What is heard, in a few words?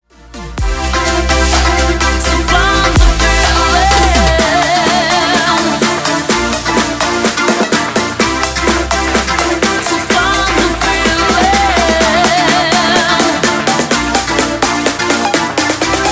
mashup